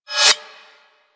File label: Tonfa Blade Spin; Warframe; Tenno